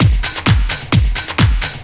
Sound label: groove, DRUMLOOPS; Techno Trance; Ueberschall; Techno Trance Essentials